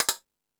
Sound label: Tool Kit, Drum Machines